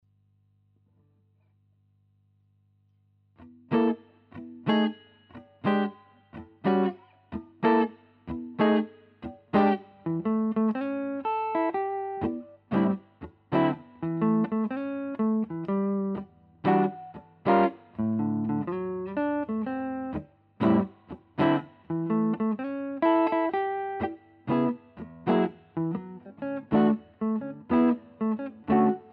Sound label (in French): micro benedetti type P90 guitare style telecaster